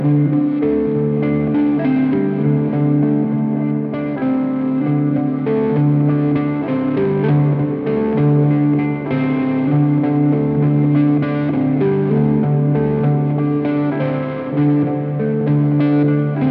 Guitar Loop; Wiseman

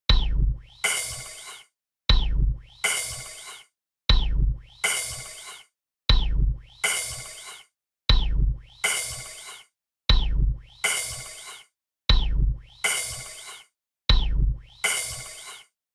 Technorganic kit 3

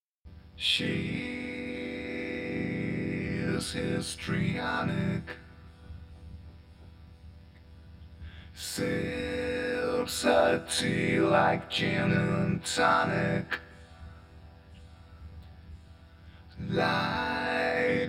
E120 Histrionic vox

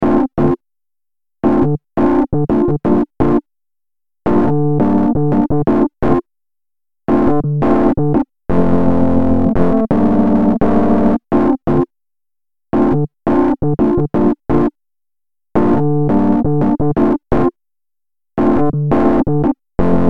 Honk tonk Piano; Xmas trees; scomber

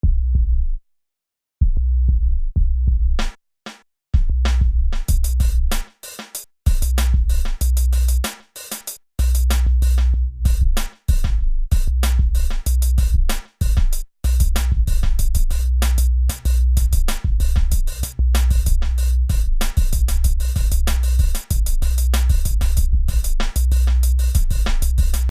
Subliminal Beats; Reach Deep

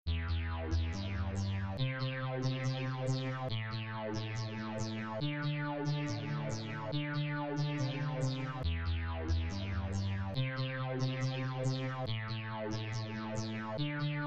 Nihilism Synths

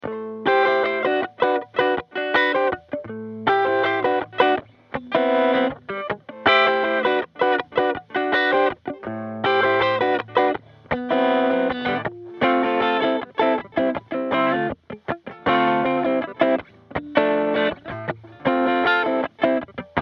Am 160 GTR RIFF

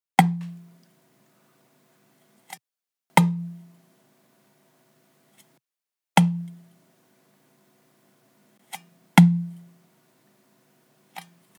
Five-Four Guitar Groove

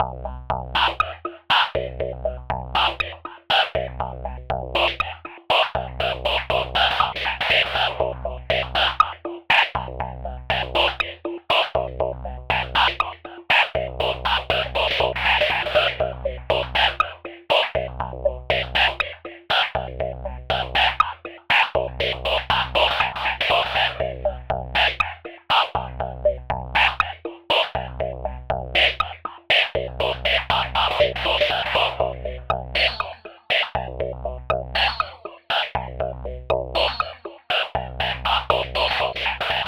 120bpm beat fx

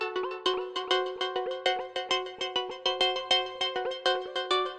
synth Liquid Keys 100bpm G

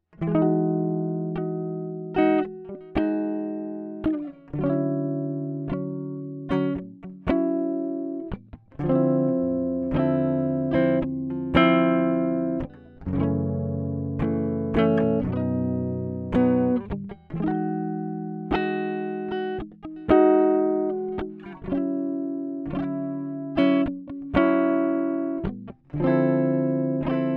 autumn sketch gtr dry

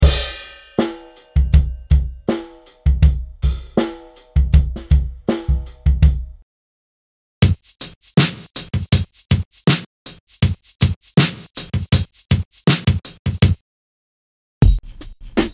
Lofi; Lofi Sound Pack

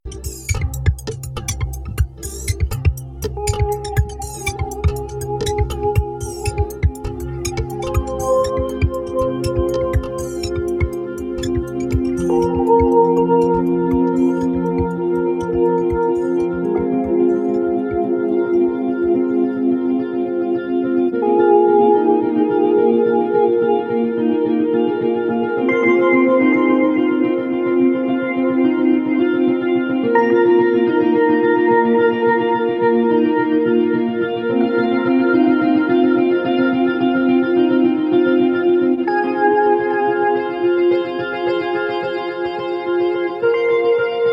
trance fragment

